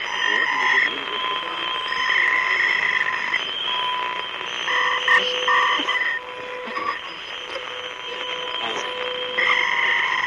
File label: radio cocktail, static